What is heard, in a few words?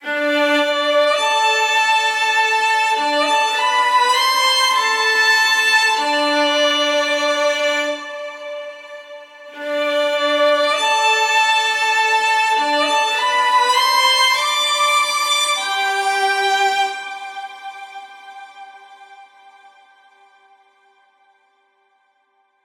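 Violin & Viola Melody - A minor 100BPM; Construction Kits; STEMS; Ghosthack; SkyRealm; Kit 10 - Windhelm Forest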